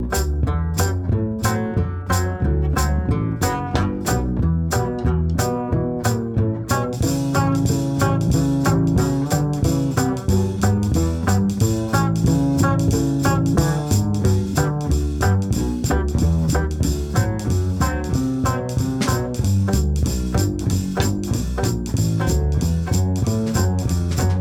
Bluesjazz Band 2 Attack1 Release 12 15 1 Warm onOff